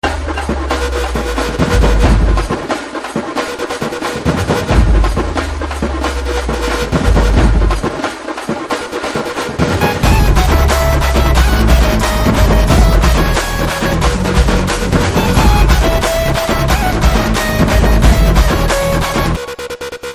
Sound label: drum beats tone, ringtone